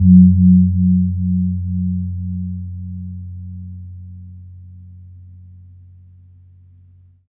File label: KEMPUR F3, KEMPUR GONG; Spectrasonics; Heart of Asia